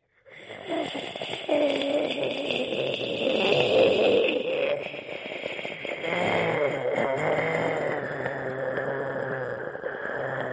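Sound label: zombie sound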